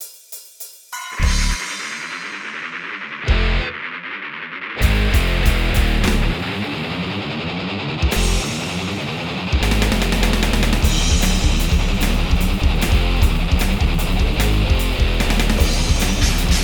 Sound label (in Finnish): riffi